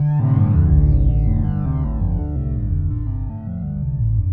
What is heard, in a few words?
Arpy Harpy, Guitartronics; Sonic Foundry (Sony Creative Software); Ma Ja Le Ethereal Textures